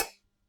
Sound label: Light Switch Click